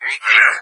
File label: attack, hazmat zombie; doom3